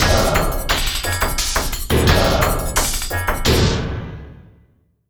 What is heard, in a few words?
Industrial Loops; USB Soundscan vol.24; AKAI